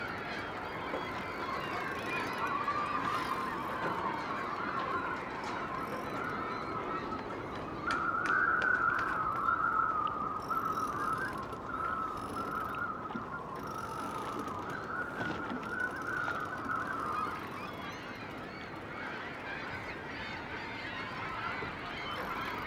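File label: ambience harbour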